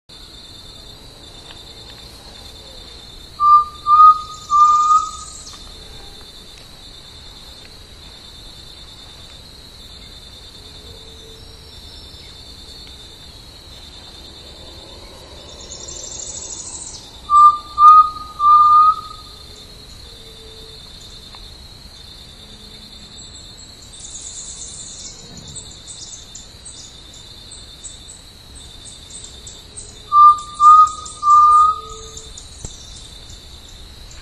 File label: Crypturellus undulatus; PN Chaco; Jorge La Grotteria